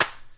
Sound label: clear clap sound e key, Royality free hand clap tuned to the E note; single hits one shots